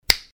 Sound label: Snap